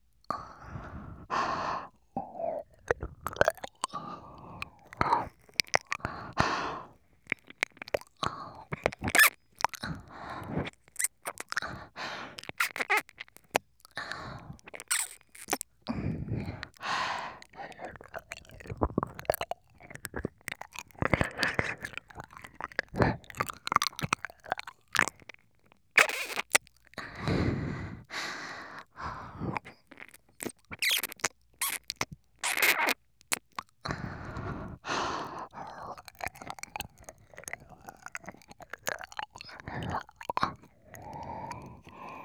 耳舐め A(右)

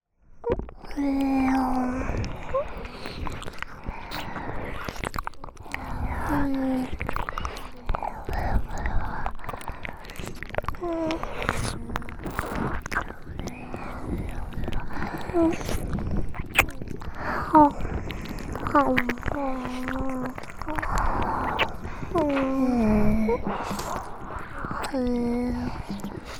耳舐め, ASMR; 耳舐めダイジェスト音声(キャラボイス付)

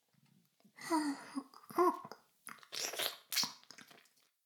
耳舐め音声, ASMR; 古き城のドラゴニート2

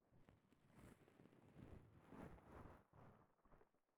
膝枕, 耳かきに関する音; KU100

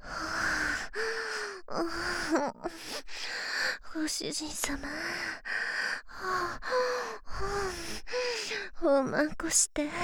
エッチしよ 喘ぎ; ハーレムオナサポ 4人のメイドがご主人様のオナニーをお手伝いするバイノーラル～文、千曜、名月、莉桜～

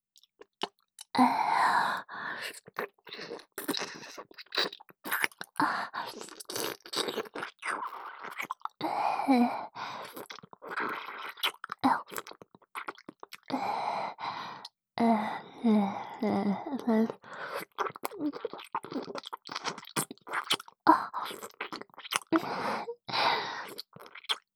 舌にフェラをするような感じ、すすり上げる, キス; おまけBGV

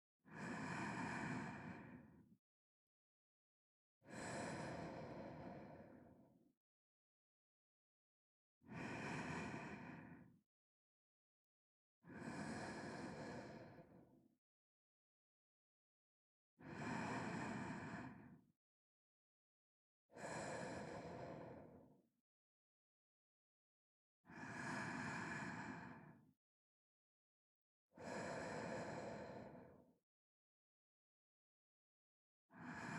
寝息トラック(L), ASMR

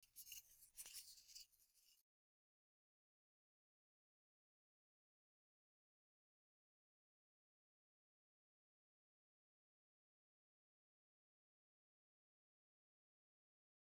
(左) 竹耳かき 耳のふち、耳たぶ音; 耳かき音部位分けVer.

